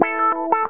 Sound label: GTR, Funky; Zero-G; Funky Elements